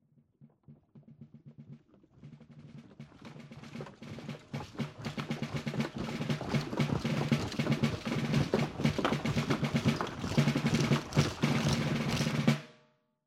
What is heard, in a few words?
Marching; Age of Empires III; cinematics